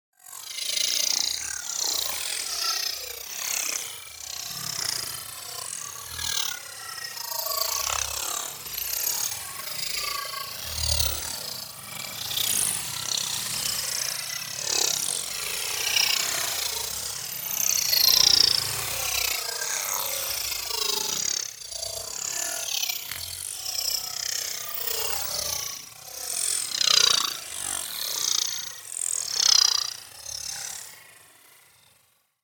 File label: NEON PARTICLES SMALL SLOW Micro Flow; boom library